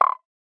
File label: croak; message tones